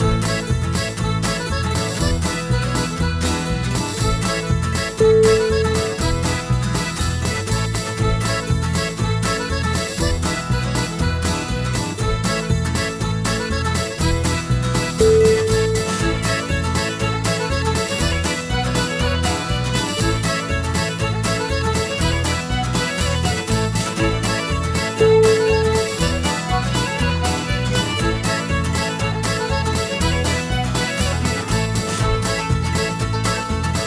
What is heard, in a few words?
celtic fling